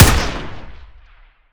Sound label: dartgun; garrysmod